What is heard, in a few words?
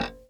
gb2 release, glowlybass